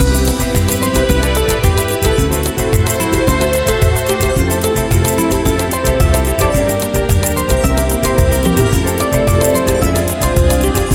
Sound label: Bossa Nova Game Theme Loop